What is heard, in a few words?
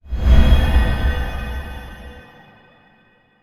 transform; yamiko